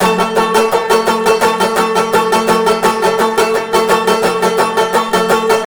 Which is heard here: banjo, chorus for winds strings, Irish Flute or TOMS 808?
banjo